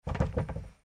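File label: wild west sounds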